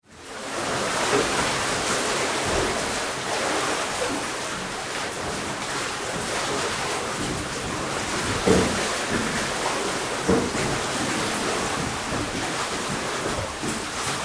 Ocean Sea wash in Wreck, Sea moving around in hull; some wood moves